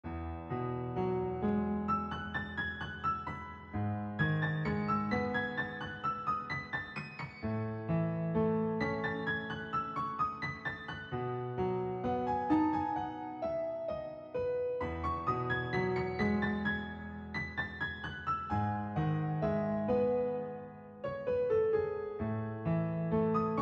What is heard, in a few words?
Piano Tear